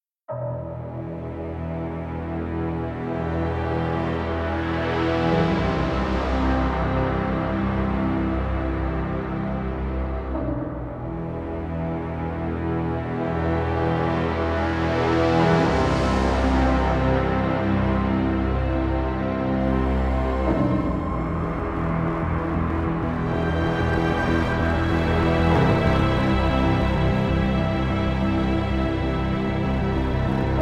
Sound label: Music Sad Ominous No Choir; starcraft 2 wings of liberty; MissionMusic